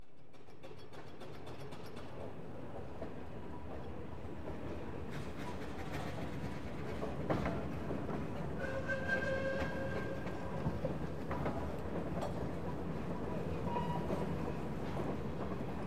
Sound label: Traction Engines Manuevering, Age Of Steam; BBC Sound Effects Library